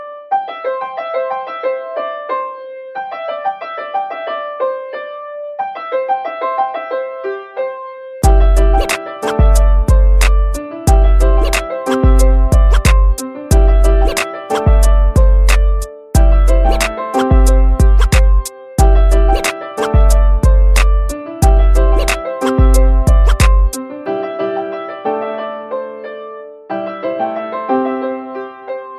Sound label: Happy Piano Song